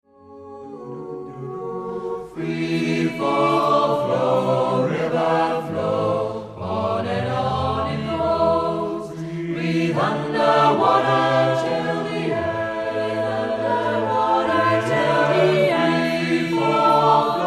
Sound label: ssatb